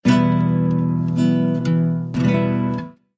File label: busker scatter; games; Corrida Romance; Wazdan